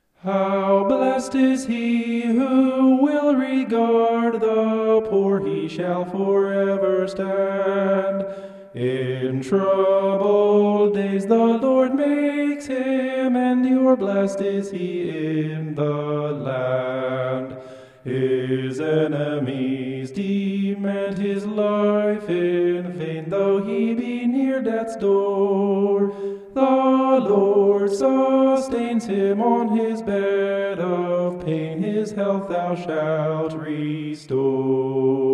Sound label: vocal homoph; psalm 41